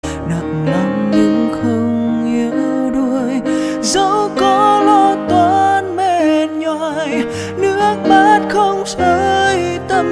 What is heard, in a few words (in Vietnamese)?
nhac chuong